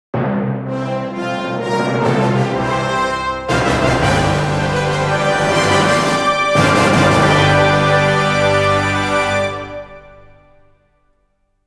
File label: Roll Out Jingle (Long Version); The Wonderful 101